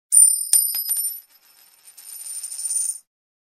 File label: Coin Drop